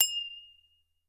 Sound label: glass